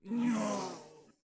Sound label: Death Vocals